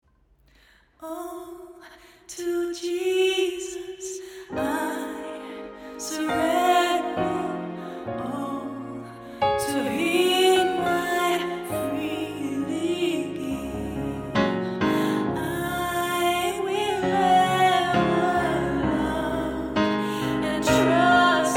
Alt, vocale partijen choir; Presence op het Malieveld

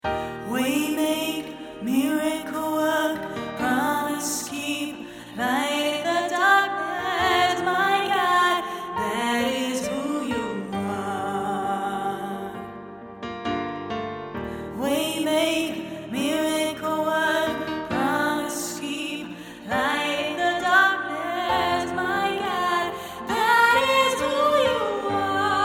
Alt, vocale partijen presence choir; Presence op het Malieveld

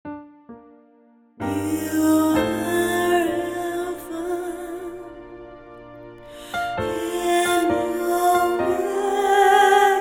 Sop, vocale partijen presence choir; Presence op het Malieveld

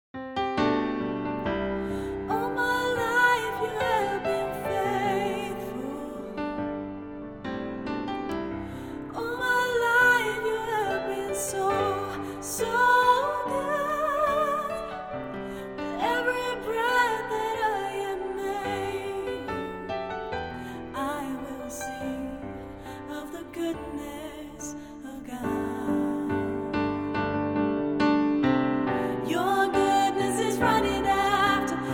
Sop, vocale partijen presence choir; Presence op het Malieveld

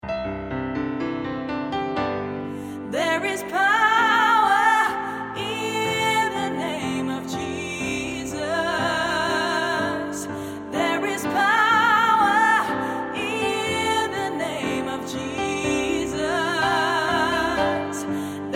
alt, vocale partijen choir; Presence op het Malieveld

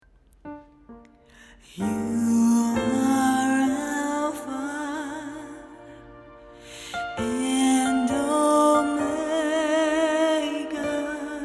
Alt, vocale partijen choir; Presence op het Malieveld

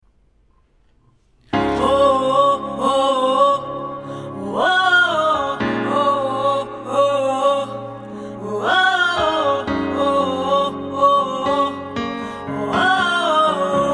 Sop, vocale partijen presence choir; Presence op het Malieveld

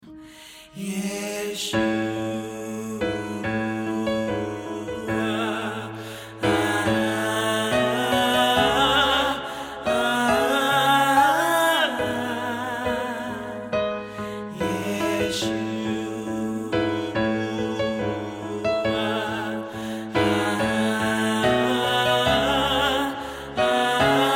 Ten, vocale partijen presence choir; Presence op het Malieveld

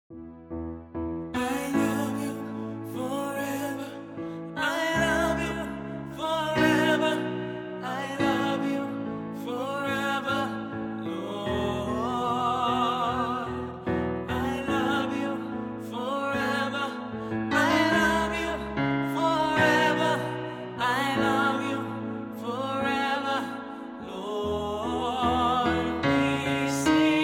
Ten, choir; Presence op het Malieveld

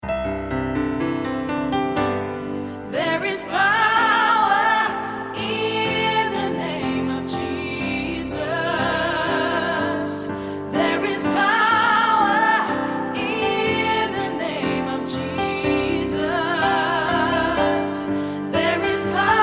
Alles, vocale partijen presence choir; Presence op het Malieveld

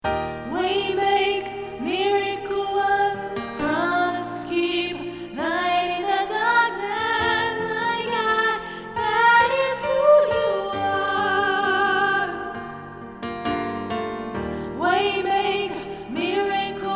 Sop, vocale partijen choir; Presence op het Malieveld

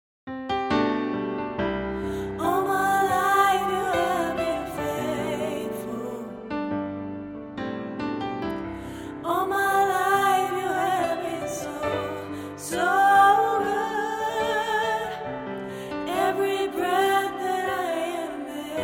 Alt, choir; Presence op het Malieveld

